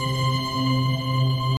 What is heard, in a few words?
tone; tripz